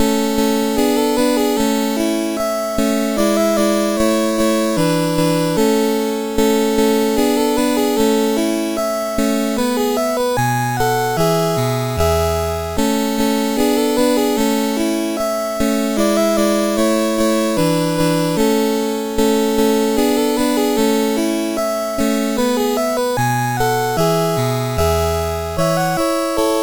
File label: Anglaise, CSG8580R5; Joseph A Grau